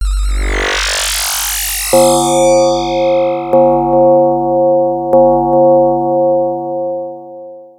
Tolling Fade; Demon's Tilt

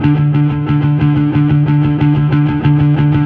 Fuzz E harm

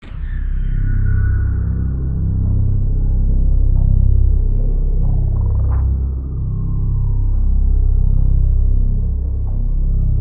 Terror Suspect Drone

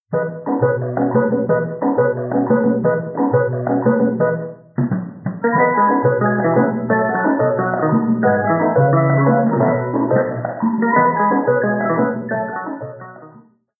Quirky Dog ringtone radio effect; Play With Me

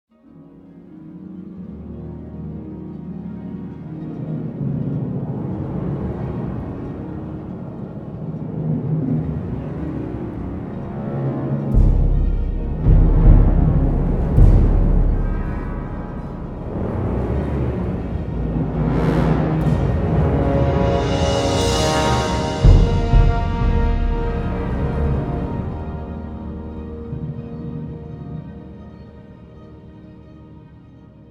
Hatchery Music; jurassic world evolution 2 ps4 ps5 windows xbox one xbox series xs gamerip 2021

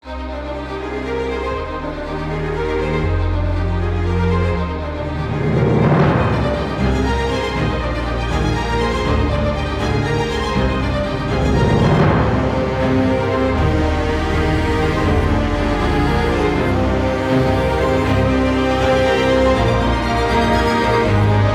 Panic; New Cycle

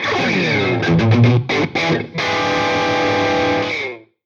Music Jingle; ps vita; gamerip; Scared Rider Xechs Rev.

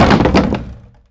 barricade fall

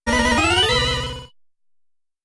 Jingle - Bomb Defusal Success

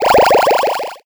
Bonus sound; Blastmorph Pinball; xbox series xs

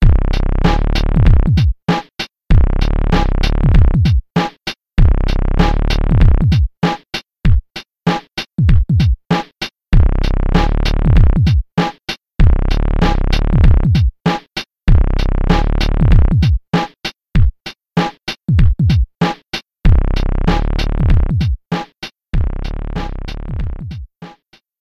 Hip-Hop; Mario Artist; Talent Studio

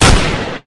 Rifle; MilkChoco

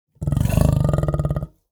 Jaguar Idle action; Grow and go

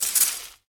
Rake Grass; Prison Architect

